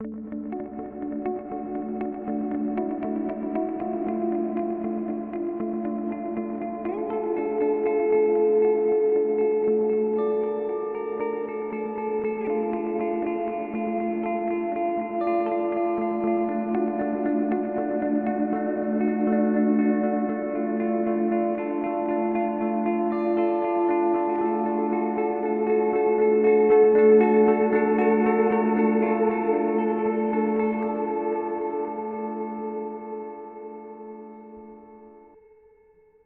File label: TC Electronic Hall of Fame 2 x4 Hall